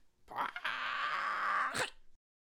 Balloon Archer Attack Vocals

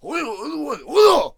Ninja Attack Vocals

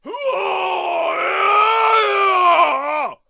Jarl Attack Vocals

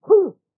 Executioner Attack Vocals

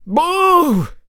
Death Vocals

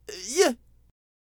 Cactus Attack Vocals

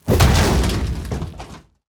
Safe Hit

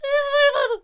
Bomb Cannon Attack Vocals

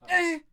Ice Archer Death Vocals

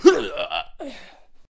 Super Boxer Death Vocals

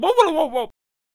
Potionseller Attack Vocals

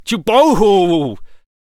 Attack Vocals

